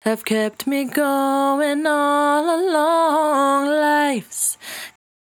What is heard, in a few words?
68bpm D, Velvet Vocal; Zero-G